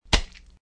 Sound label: splat